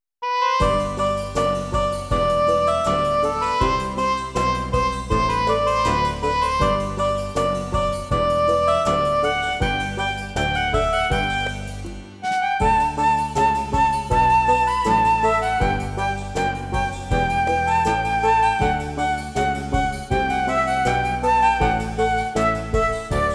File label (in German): Musik; Hundeglück; Cornelia Funke erzählt von Bücherfressern